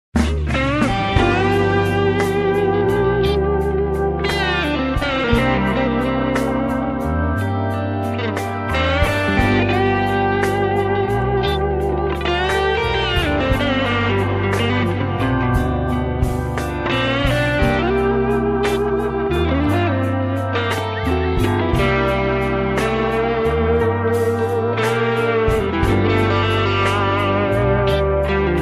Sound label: blues chorus